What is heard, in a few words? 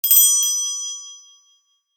ring hand held bell